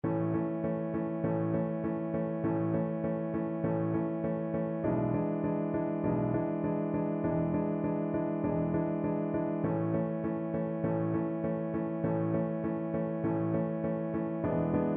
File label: Runs Low Voice accompaniment